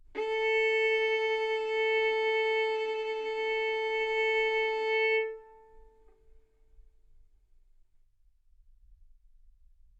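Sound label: Violin arco mf A string A4 B4 stereo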